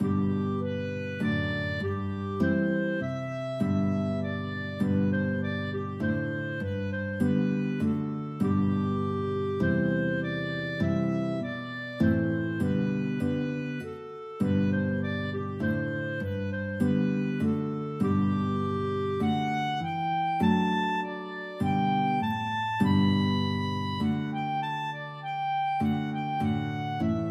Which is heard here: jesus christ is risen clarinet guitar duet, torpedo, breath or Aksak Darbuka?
jesus christ is risen clarinet guitar duet